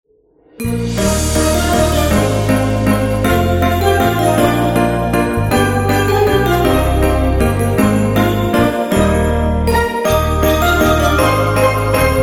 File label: Christmas Carol